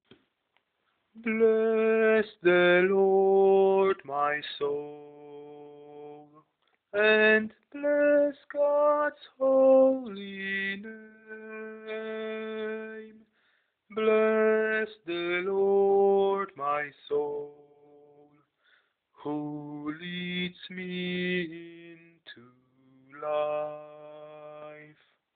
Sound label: Bless the Lord sopran